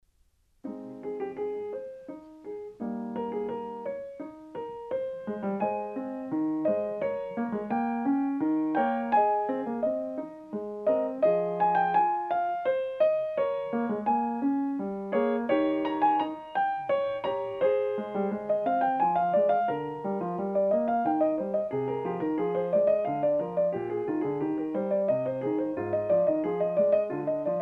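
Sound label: Prelude In A Flat Major; Bach; BWV 862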